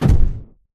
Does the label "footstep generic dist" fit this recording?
Yes